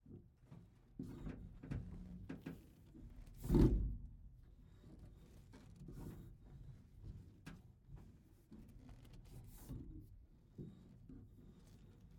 brdm2 steering wheel; var sfx; mkh8060; sonniss gdc

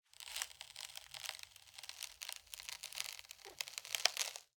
break spatula cabbage; sonniss gdc

